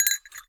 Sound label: Cowbell Chain, Percussion